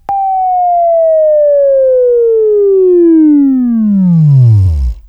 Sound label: Buzz, Left